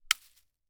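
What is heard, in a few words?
Weapon Bullet Shell 12 gauge Drop On Leaves; AdobeCC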